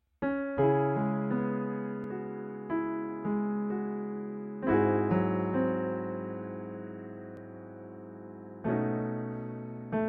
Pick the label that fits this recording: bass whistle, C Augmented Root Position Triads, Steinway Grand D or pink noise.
Steinway Grand D